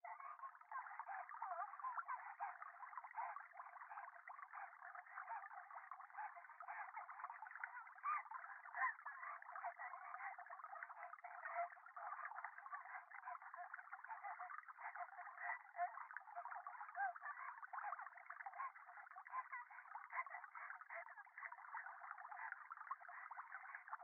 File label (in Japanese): 電話 可愛い喘ぎ 川のせせらぎ